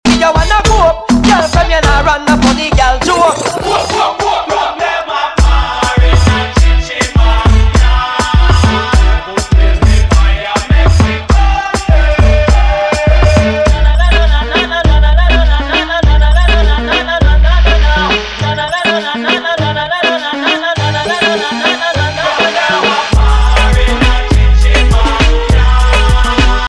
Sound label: MEGAMIX RAGGA DANCEHALL